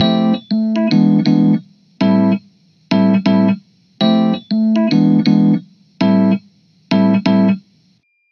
dry amp epiano; multiband; prism distortion

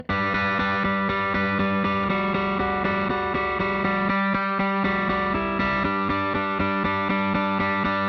guitar distorted; honeybee; Morphdrive

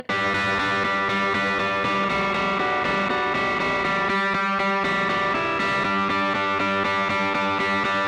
guitar distorted; Morphdrive

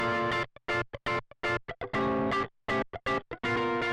guitar funk; souldriven; Morphdrive

